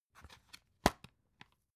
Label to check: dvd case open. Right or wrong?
Right